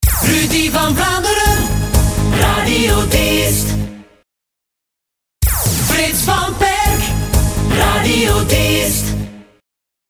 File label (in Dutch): Naamjingles; Diest